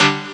Synths G; vengeance essential club sounds-3

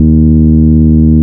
Root D#, Bass; Vengeance Samples; Vengeance Ultimate Bass

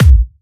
Hard Kicks; Vengeance Samples

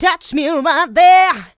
voc, female vox, Vocal House

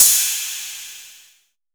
Crash, Cymbals; Vengeance Samples; VEH3 Cymbals

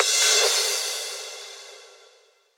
Cymbals crash; Vengeance Samples

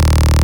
Synth Bass; Vengeance Samples; VEE Synth Shots

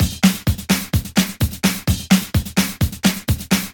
House Beat, 128 BPM

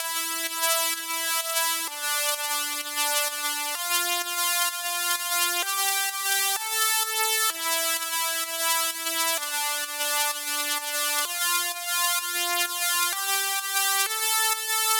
Notice Melody 3 Root D, 128BPM; Vengeance Samples; Notice Kit

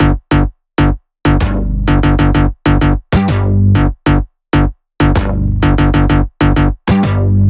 128BPM Melody; Vengeance Samples; VFH1 128BPM Tron Trio Kit

